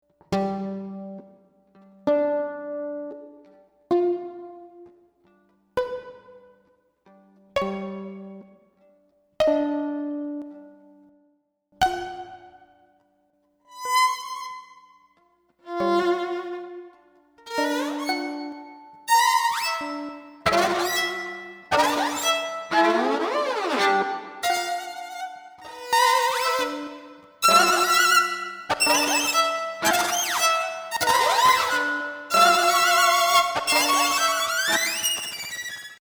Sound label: hyperviolin